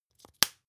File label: spice jar small close hard; industrie wirtschaft; gastronomie